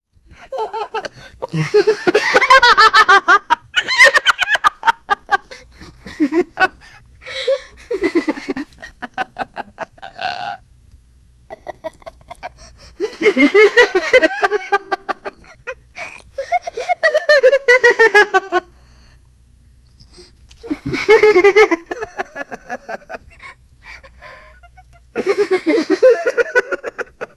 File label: 12 year old wolf man; has 12 year old wolf man